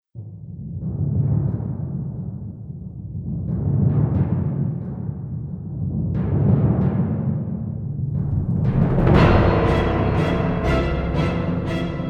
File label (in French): tempete